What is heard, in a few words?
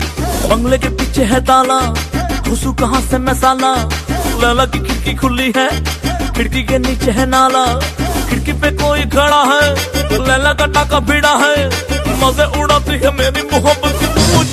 Pungi